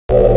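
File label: laser loud; Window of Retaliation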